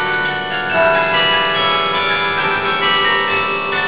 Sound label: carillons